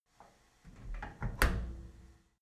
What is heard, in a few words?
cleaning machine close handle